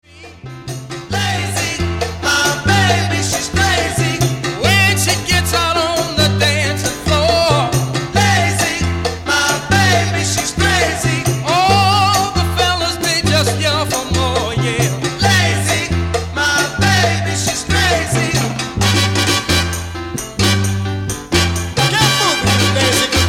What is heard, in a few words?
Lazy Boogaloo